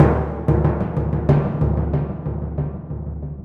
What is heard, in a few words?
Timp